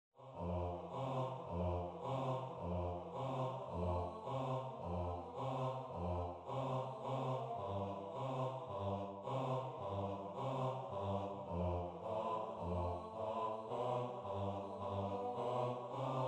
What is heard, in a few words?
bass